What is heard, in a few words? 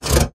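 sounds of scratches on metal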